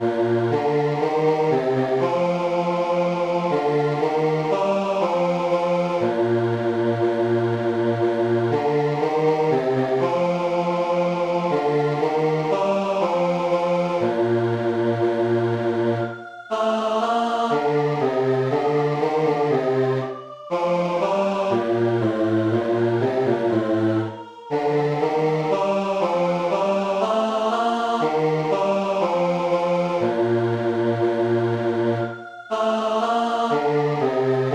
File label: ding dong merrily on high Bas; bedfordmodernsingers